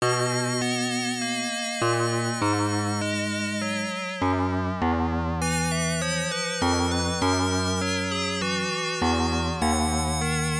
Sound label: Level Clear, Game Over; Gun Dealer; arcade